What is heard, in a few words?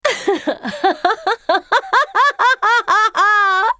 laugh; Miss Fortune